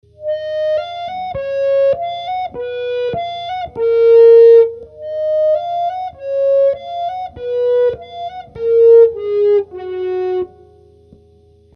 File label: ebow Flute